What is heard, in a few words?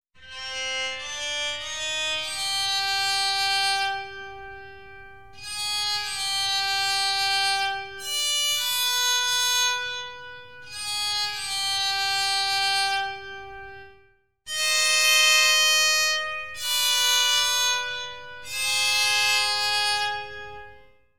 Psaltry Bowed Verb